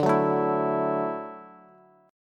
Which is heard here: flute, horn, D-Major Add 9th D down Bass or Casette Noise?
D-Major Add 9th D down Bass